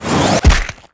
Counter; Fly For Insanity

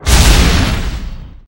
skill bow range strike; FlyForInsanity

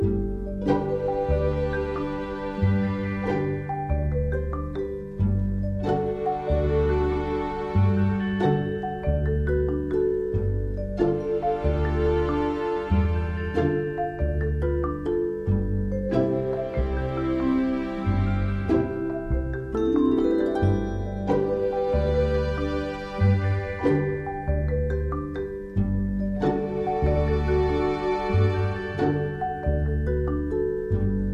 music in game tension loop; Candy Crush Friends

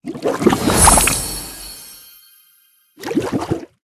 win screen chocolate; Candy Crush Friends

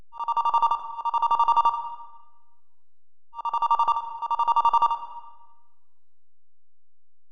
ringtone; Microsoft Office; Lync

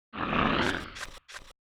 Yeti Idle; FlyForInsanity

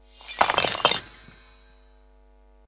piggybank break collect; Candy Crush Friends

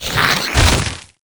Npc Atrox die; FlyForInsanity

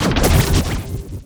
Poison Arrow; Fly For Insanity

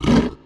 hurt; FlyForInsanity

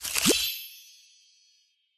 paper; Candy Crush Friends

